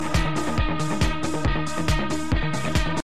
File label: Science message tone